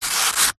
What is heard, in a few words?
industrial sand paper single rub against sand paper